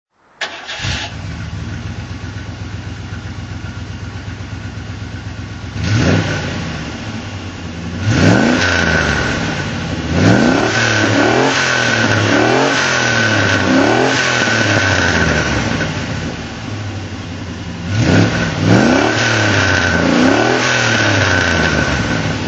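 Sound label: rover p5b rally throttle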